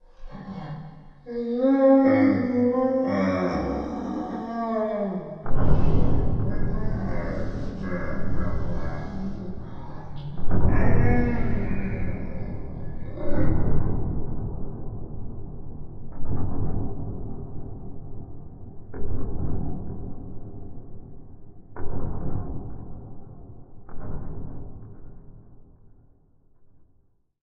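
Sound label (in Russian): хоррор стучащая кукла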